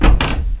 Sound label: DOOR OPEN; RenderOrgan